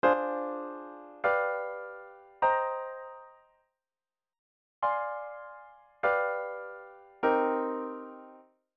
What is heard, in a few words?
Minor Scale II V I; ii°-V-i Progression